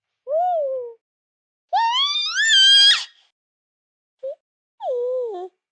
Giggle Squeal, Laugh, 1 baby, 5 months., happy cooing, scream, human voice, child, kid, infant; Laughter Sound Effects